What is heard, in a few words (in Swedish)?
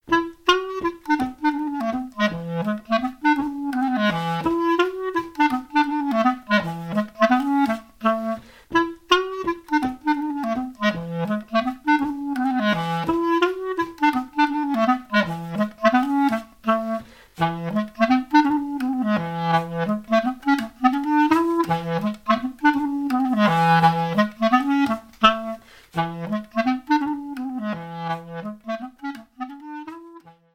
polska